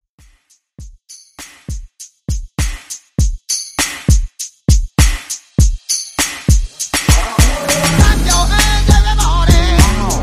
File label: Top40 Hype Redrum 100 Bpm Dirty